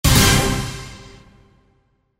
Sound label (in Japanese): デデデン